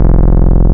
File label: Minitaur Oct Saw, wavetables; Animoog